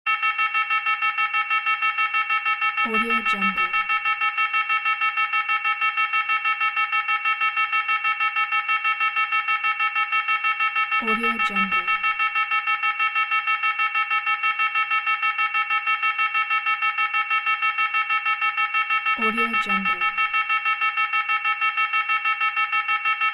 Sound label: alarm beeps